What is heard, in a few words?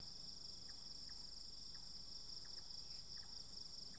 cicadas loop; background; insects